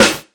snare mid noise yamaha, Classic Chicago House Drum Hits